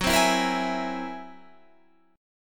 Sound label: F sharp 9th Flat 5th F sharp Guitar Standard; strummed; JGuitar